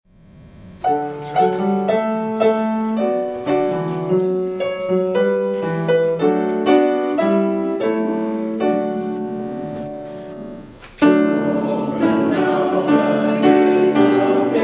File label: hymn; Open Now Thy Gates of Beauty